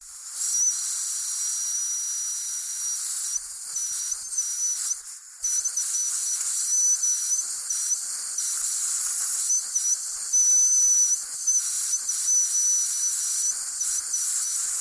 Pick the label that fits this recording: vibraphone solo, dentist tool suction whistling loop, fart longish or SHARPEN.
dentist tool suction whistling loop